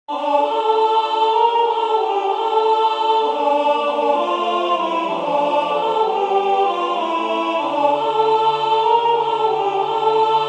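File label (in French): soprane, Musique sacrée; Venez Sauveur aimable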